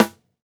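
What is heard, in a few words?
snare, Roland MV8800 drum kit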